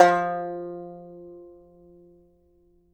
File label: banjo F3, banjo notes, country; Sampleheads - Whole Lotta Country 1 & 2